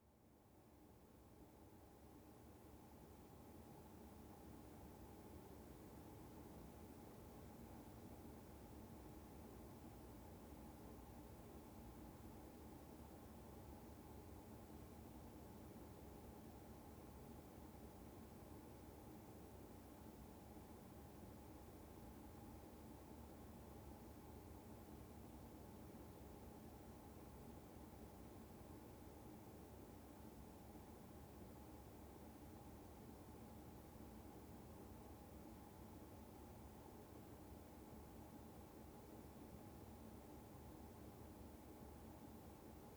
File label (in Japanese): 煮詰めて、混ぜて、こそげ落とす。製塩体験の音